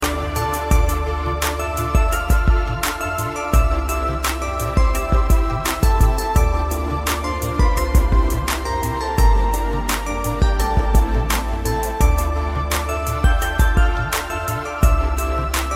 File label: Valentine Soft, Ringtones